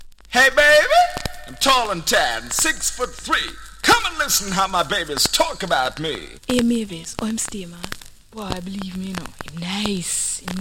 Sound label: heavy heavy reggae